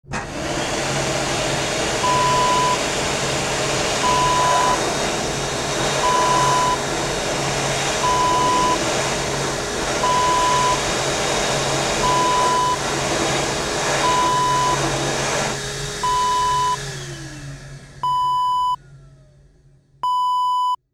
hand dryer

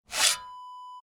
shovel pull out

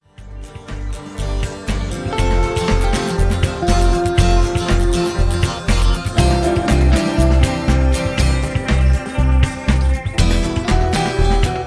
Ambiental Rock

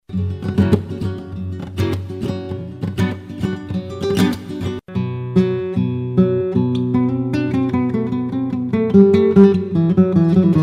flam

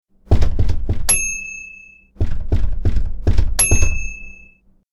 Knocking On Door Hard

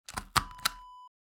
hole punch

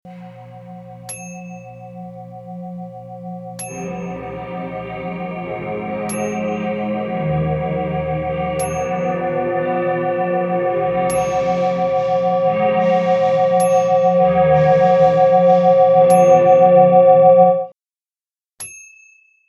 Old School Scifi

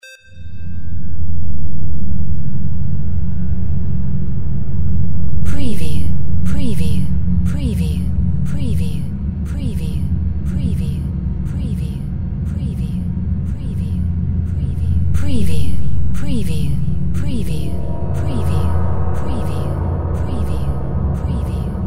DRONE BRASS GENERATOR FX

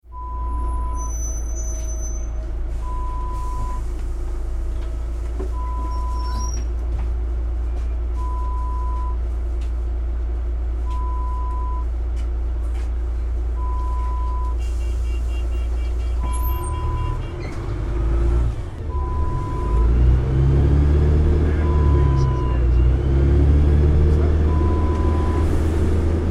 Bus internal sound effect

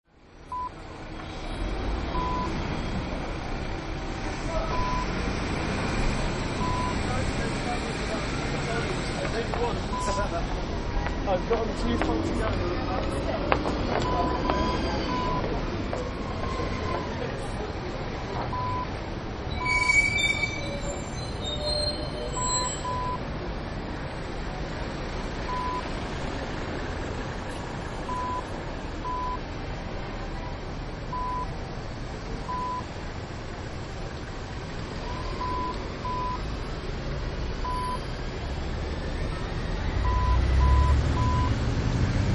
Night City Atmos

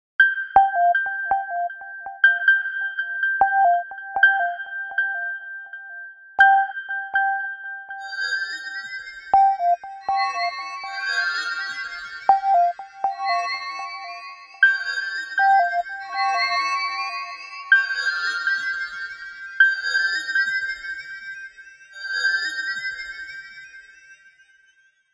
Sonar Ping; Sonar Ringtones; Ping Hunt

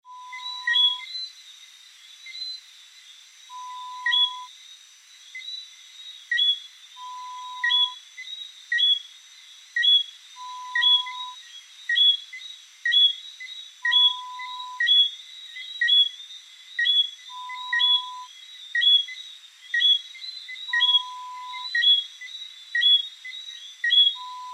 tropical bird sound

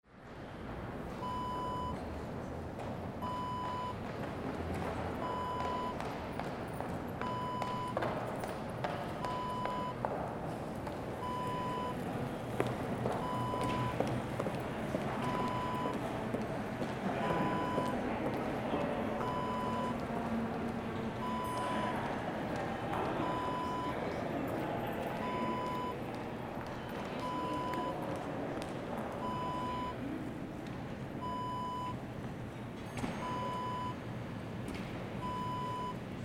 business building lobby ambience

